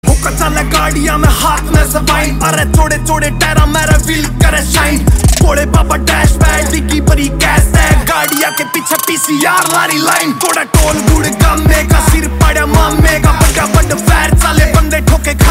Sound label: ringtone